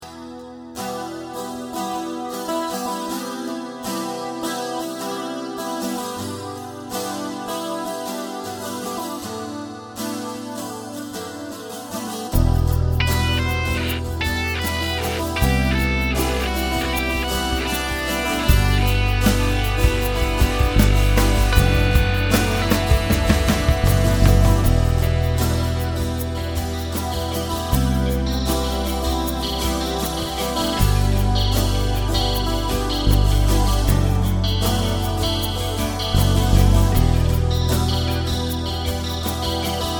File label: bass, instrumental cover